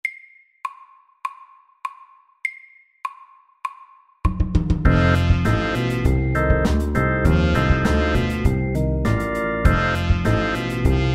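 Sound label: 12 bar blues, slow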